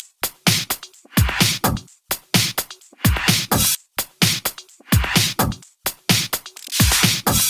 House loops 128BPM; Vengeance Samples

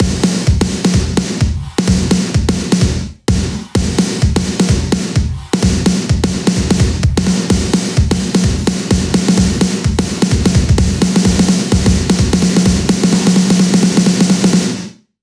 128BPM, House Electro Fills; Catchy 6; 8Bars; Vengeance Samples

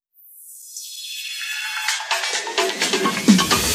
Fill 128 BPM; Vengeance Samples; VEH1 Loops

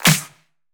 Pre-Shifted Claps; Vengeance Samples

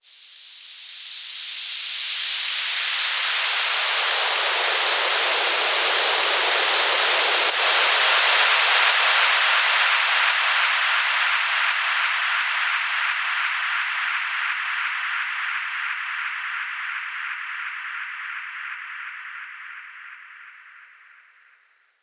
FX Downlifter

